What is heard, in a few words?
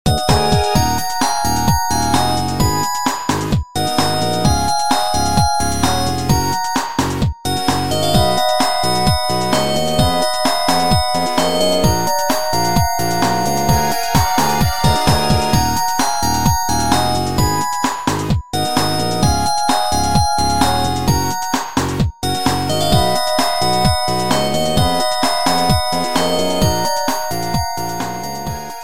Chime